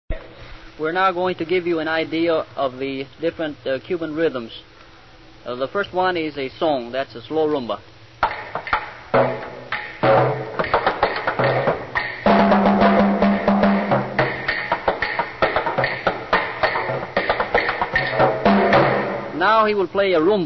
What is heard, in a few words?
Five Cuban Drum Rhythms; Folklife